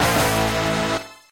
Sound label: dununnn; Undertale